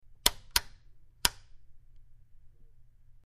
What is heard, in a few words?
Rotary Dial Turns, switch clicks